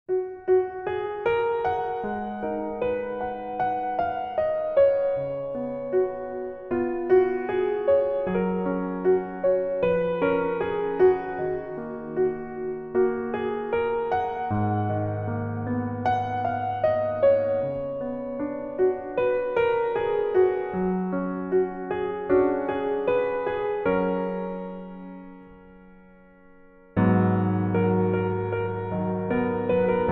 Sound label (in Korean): Piano ver, 멜로디MR, 여자키